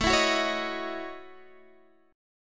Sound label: Gb Minor 13th A